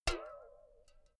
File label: filled can hit ringing long